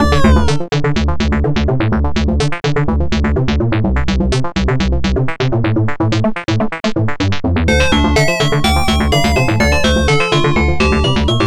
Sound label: waveform test; jigsaw